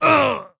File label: wound; Skadi The Ruthless